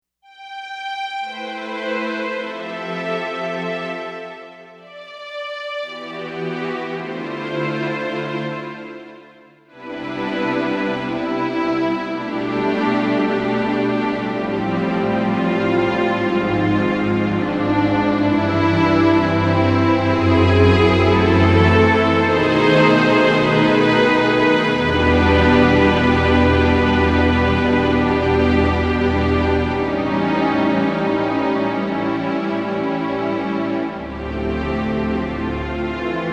strings

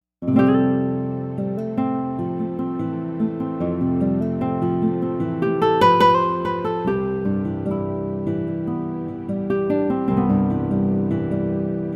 nylon guitar; jupiter-80

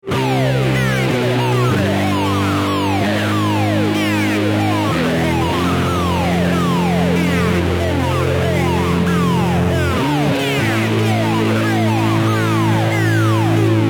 wave pedal

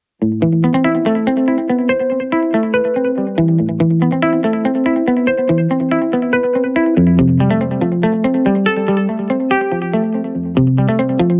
muted guitar

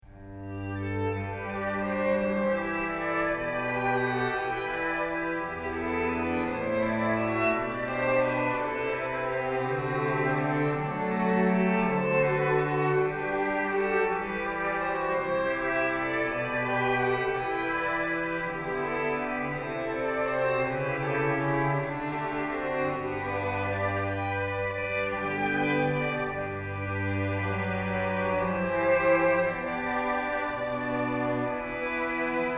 strings

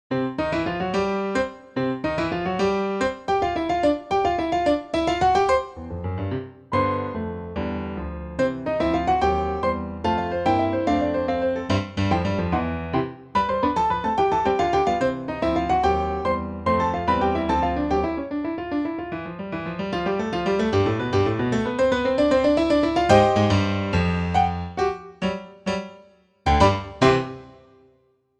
twin piano mode; HP-203

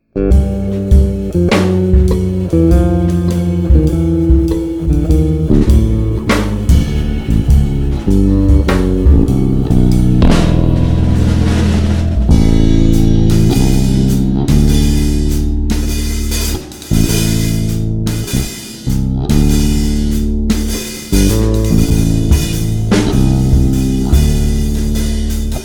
me-20b patch; ME-20B Bank 10, No. 1; Deep, dense sound using octave effect plus reverb.

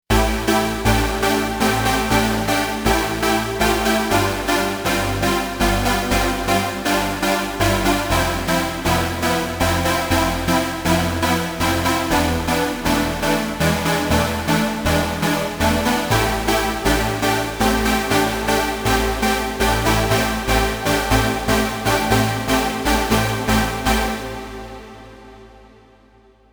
sh 201 super saw key

